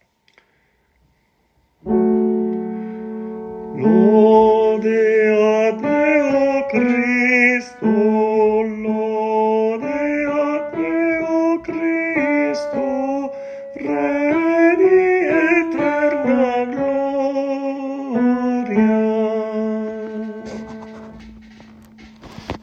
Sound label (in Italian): tenore; Lode a te o cristo